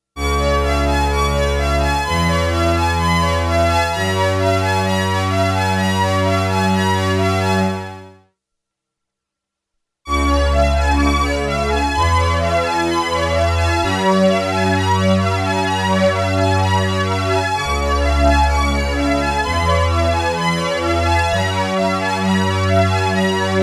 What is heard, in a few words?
SYMPHONIC